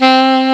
SOFT SAX 3

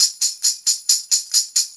Brass Tamb, Perc Loops; Sonic Foundry; Drum Tools

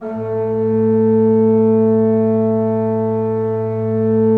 A2, Cathedral Organ; ILIO; Propeller Islands

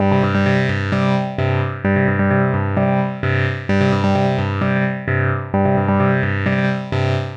130 C synth, Synth Loops, Trance

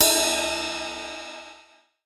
Ride CYM; SN-R8-11 Metallic Percussion

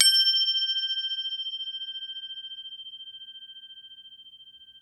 CROTALE G5 R, Crotale

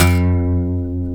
G, funk guitars; Spectrasonics; Hans Zimmer Guitars VOL-1; TAKA FUNK

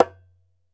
ASHIKO, African Drum

